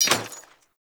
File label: Burst Gun Mech; Warframe; Archwing